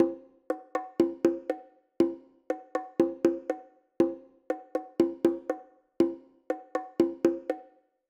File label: Bongo Pattern